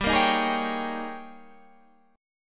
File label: D sharp 11th G